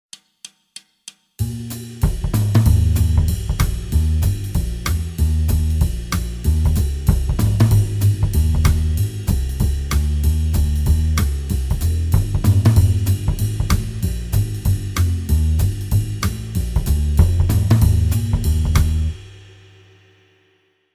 Jazz backing, Guitar

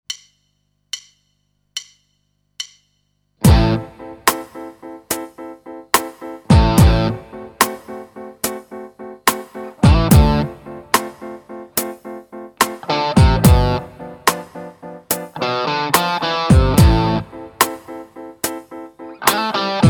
Rhythm 12-8 blues guitar; Guitar Techniques 2017 Issue 275 November

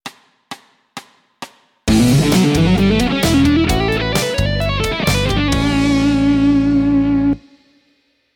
Lick fast, Guitar; One Minute Lick; Guitar Techniques 2019 Issue 294 Spring

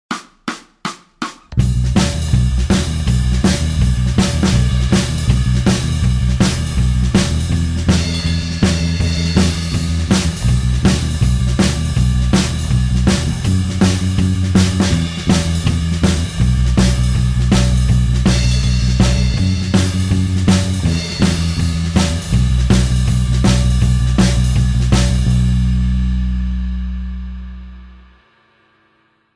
slide jam backing; Guitar Techniques 2018 Issue 278 February

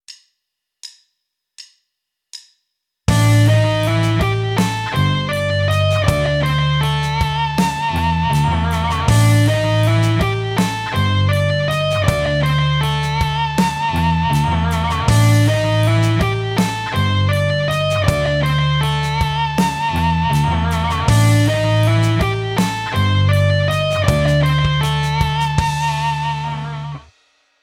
Tapped Harmonics, guitar; Rockschool; Guitar Techniques 2019 Issue 290 January

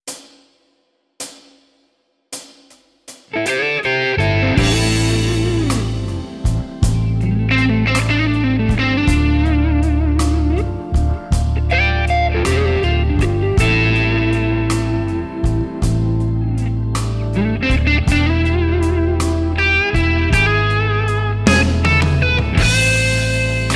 Blues; Guitar Techniques 2019 Issue 296 June

